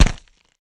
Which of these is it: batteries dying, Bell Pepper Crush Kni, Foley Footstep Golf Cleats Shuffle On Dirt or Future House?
Bell Pepper Crush Kni